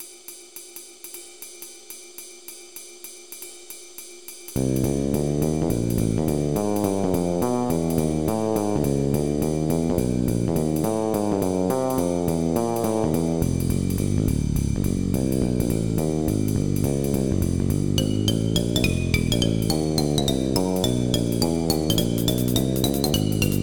drummy

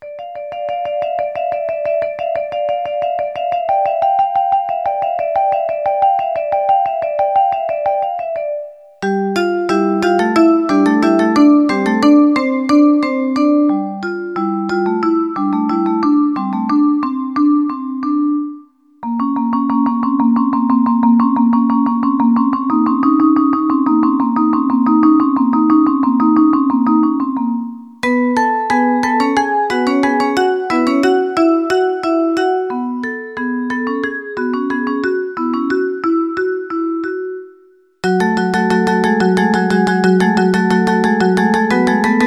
duet in d minor, chiptunes

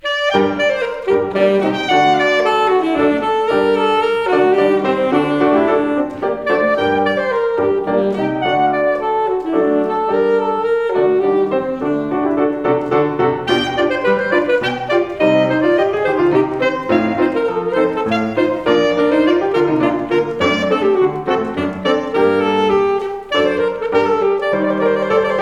Sax Sonata in G minor movement 2; Henri Eccles; umass recitals

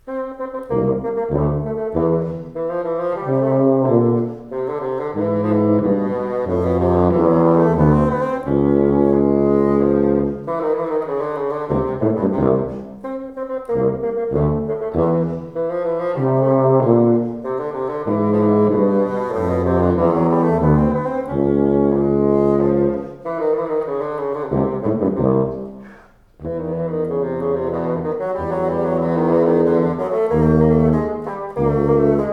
Fagott and Violoncello; Stucke fur Fagott and Violoncello (III.); Paul Hindemith; umass recitals

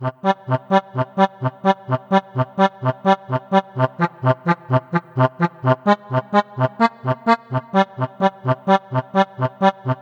a little accordion music, chiptunes

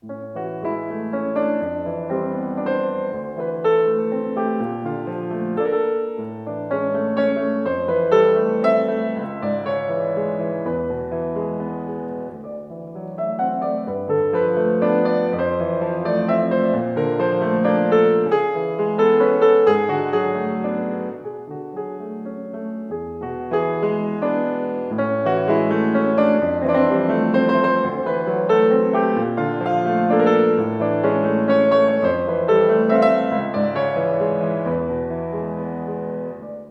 waltz

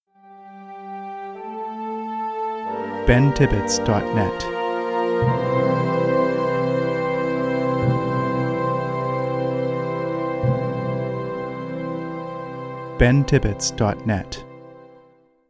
Gentle Orchestral Swell Ident

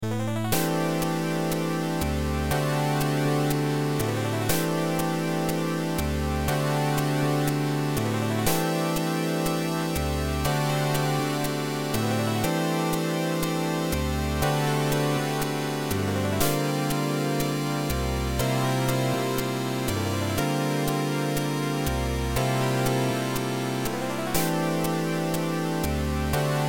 hardly like water; juvenilia

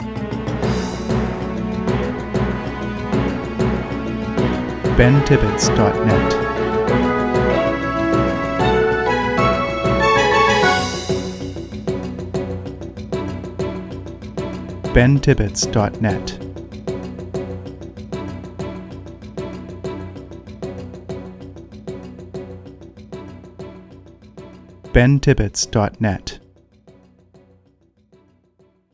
News Flash Ident

